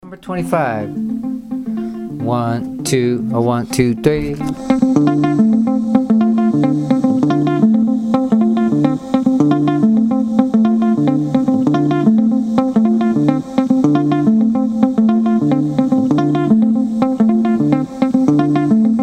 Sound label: mozambique patterns